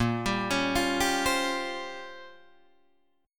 A sharp Minor 6th Add 9th A sharp down Guitar DADGAD, chord